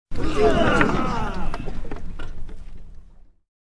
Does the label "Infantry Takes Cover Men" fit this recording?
Yes